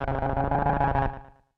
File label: KEY SHOT TEKNO 140 A; Techno2; Singleshots